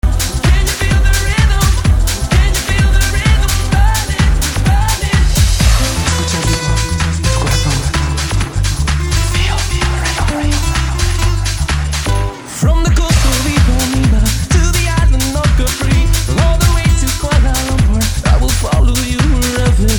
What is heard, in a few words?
rhythm